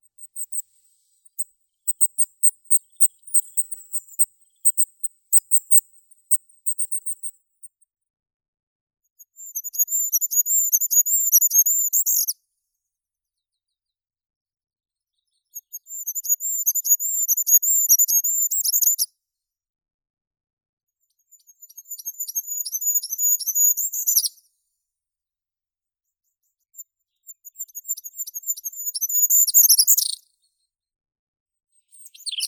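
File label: goldcrest, regulus regulus; korolek zheltogolovii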